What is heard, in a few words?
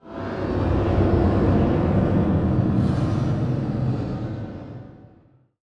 Transition Stab Dark Metallic; Production Element; Title Transition; AdobeCC